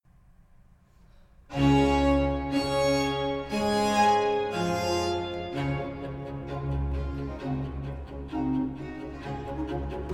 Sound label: D major for 2 violins and basso continuo and organ obbligato Allegro